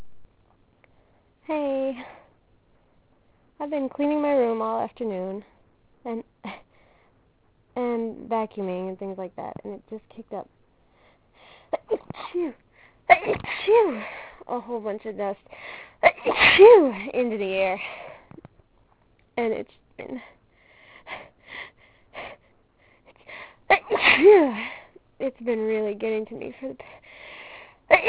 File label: cleaning, female